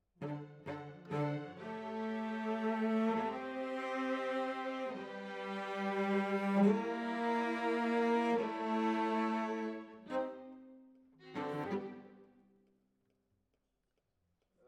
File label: Cellos